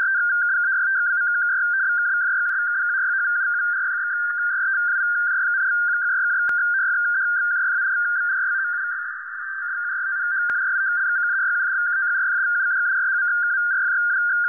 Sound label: buoy 29525; iarums